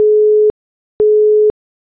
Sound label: busy; Nokia; Lumia 1320 Southeast Asia; WavFiles